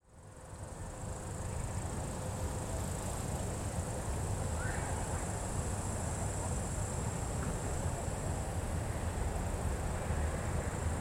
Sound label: uyezdnaya, sergiyev posad, day